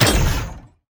tower twlight Longbows basic Attack cast no Charge